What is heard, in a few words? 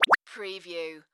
Blip Beep; sound-effects-bible